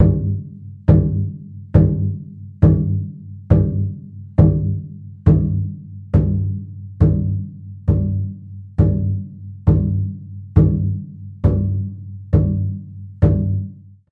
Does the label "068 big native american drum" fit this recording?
Yes